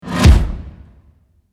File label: Energetic Upbeat Percussive Action Stomps and Claps Sting